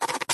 ui quill write scribble flourish decisive; skyclad sound

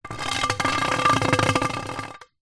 sport pool cue drag hard ground

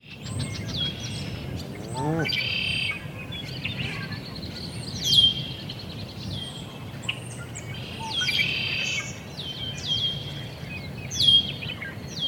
ambience countryside evening birds; felix blume

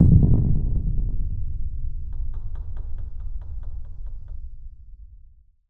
Impact Boom Flutter; bottlerocket

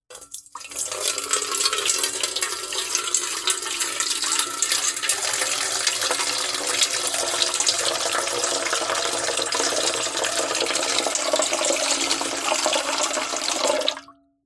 household water pour slow into large metal bowl

